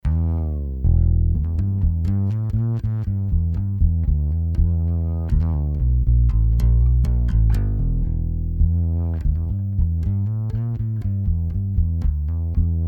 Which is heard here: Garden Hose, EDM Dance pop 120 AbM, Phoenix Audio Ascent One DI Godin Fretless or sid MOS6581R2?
Phoenix Audio Ascent One DI Godin Fretless